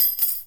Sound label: TEK NAIL, industrial